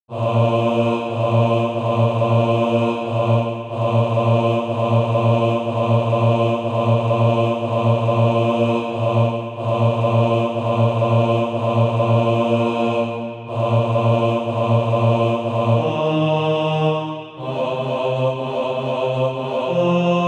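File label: bass, Practise Music; Cradled All Lowly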